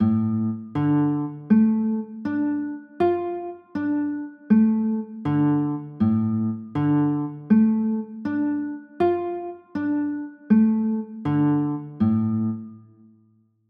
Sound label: d m, chords